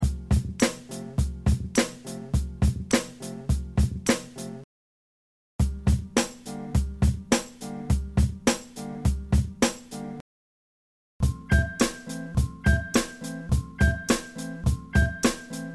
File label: DRUMS